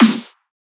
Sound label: Dubstep Snare; Ghosthack; Dubstep Sound Arsenal